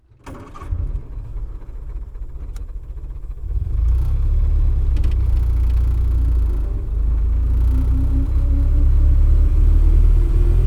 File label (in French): AMBIANCE INTERIEUR